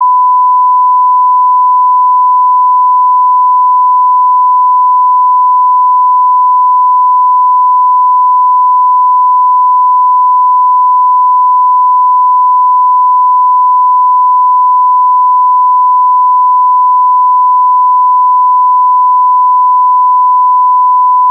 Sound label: 1kHz, test tones